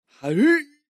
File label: vox tall Guy throw; Highland Reels